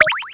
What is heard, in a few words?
interaction